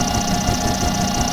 Machine Generator Loop

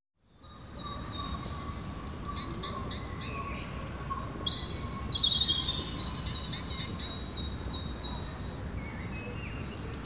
Birds evening; Birds, Insects & Animals

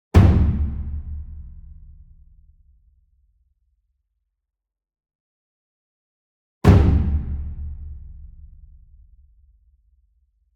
ENSEMBLE DRUM Taiko Hit Medium; boom library; Cinematic Strikes Construction Kit

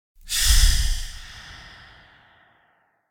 Breath Hiss - Distant Hiss Like Reverb; Hollywood Edge; sound designer's toolkit v2

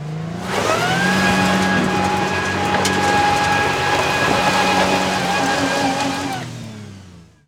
Auto Tires Spin Tires; Automobiles

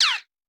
Bullet Ricochet; Sound Ideas; Guns

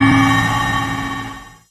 Pickup Magic; SFX Kit; Pickups

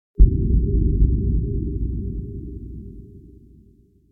Impact Underwater Bass Hit - Short; Hollywood Edge; sound designer's toolkit v2

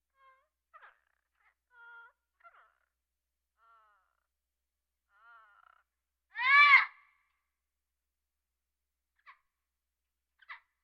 Birds

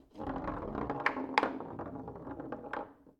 Foosball Ball Roll

